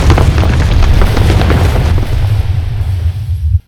tremble

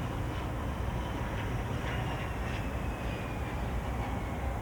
jet dive

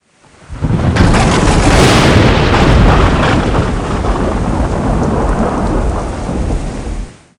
thunder

